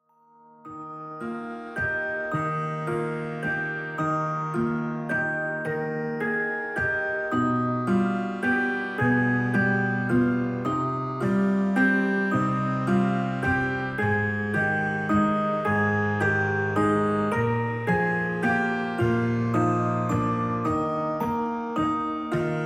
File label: Baby Lullaby